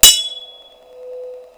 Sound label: Transverse, Shrieking rod, 120 cm Aluminium Rod; georgia 2010